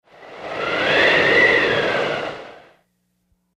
Ind Gust Wind; gusts

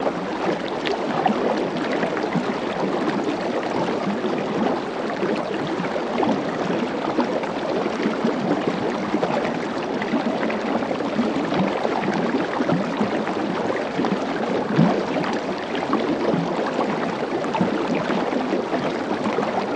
River Sml Med Fst Smoo

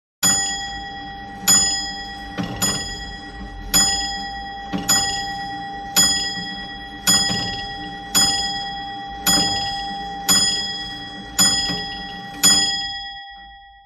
Long Case Clock Strik; clock chimes rings

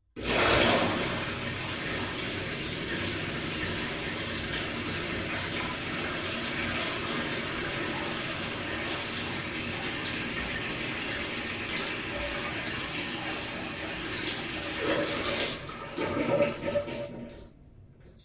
Sewerage Amb Hvy Trap

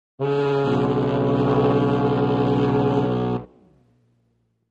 Ship Huge Low Pitched, boat horns

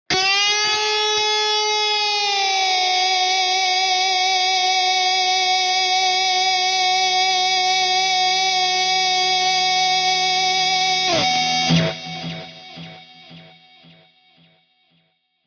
Elect Guitar, electric guitar

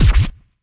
Music Record Scratch, record players; Music Effect; Normal Record Scratch Sound.

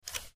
Short Mouse Slides

